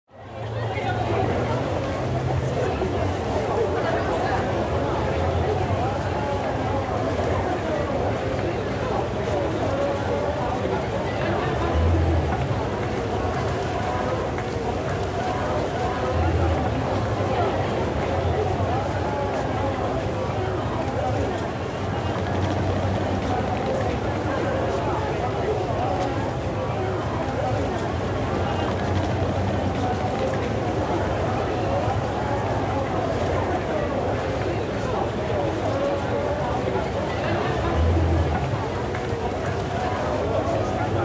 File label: City Ambi Street